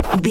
pencil sketch draw write squibble short

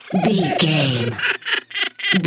goblin fairy laugh low

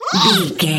goblin fairy attack

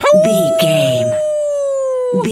wolf howl

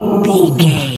bear pain hurt

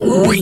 pig hog single

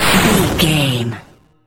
retro spaceship jet boost

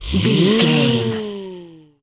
comedy siren whistle fun down

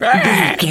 pig scream short

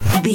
bullet flyby fast

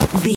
foley object grab pickup rough

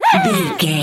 voice fun small character emote angry

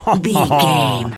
voice fun man character deep laugh

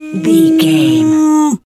cow moo

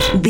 retro ui menu error

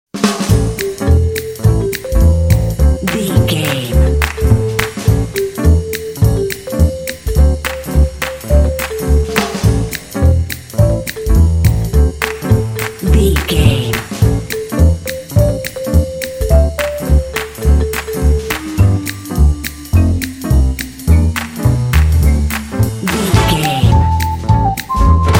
jazz hipster ad jingle

